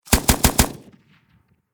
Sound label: Warfare Machine Gun Pkm Russian Four Shots Close Perspective